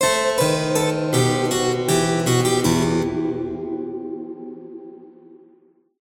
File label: Music Bourree